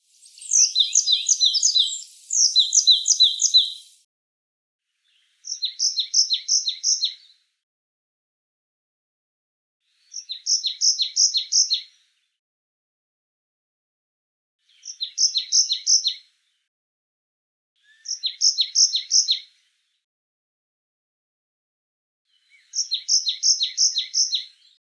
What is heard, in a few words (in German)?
Typ III Gesang, Alpenmeisen